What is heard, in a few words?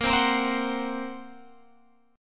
Bb Suspended 2nd Sharp 5th, Guitar Open F; strummed